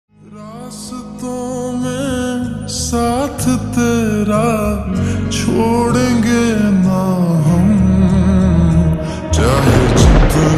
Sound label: Slowed Reverb Ringtone